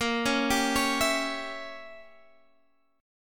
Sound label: G Diminished 7th A sharp